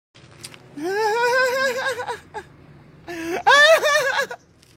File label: Poisonous laughter meme; میم خنده سمی